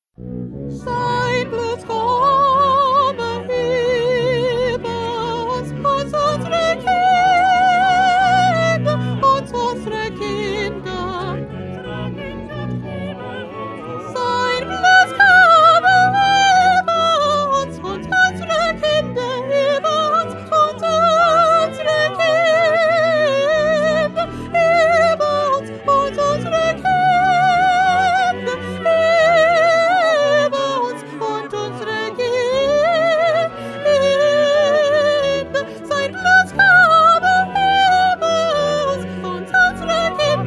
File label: Soprano; Bach; St Matthew Passion